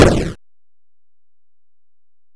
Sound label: explode; invaders